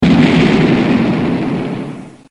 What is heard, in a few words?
explosion; pokemon reborn e19 2022